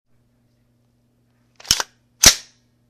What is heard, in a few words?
Charging an M4 rifle